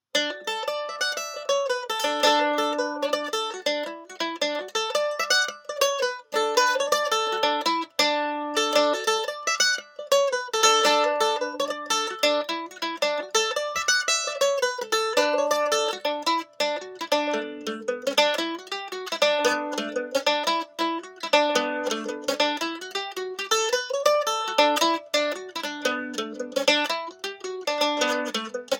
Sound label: Mandolin Pick Comparison